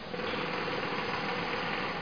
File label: jack hamr; Image Bonanza; Expert Software